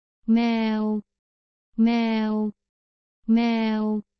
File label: thai cat